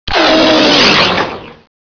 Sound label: gunner rocket